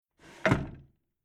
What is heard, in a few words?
Antique Old Furniture Old Wooden Draw Closed Single